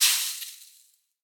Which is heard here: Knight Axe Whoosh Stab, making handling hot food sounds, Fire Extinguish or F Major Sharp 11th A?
Fire Extinguish